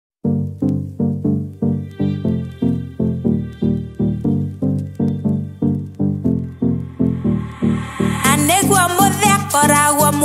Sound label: afro house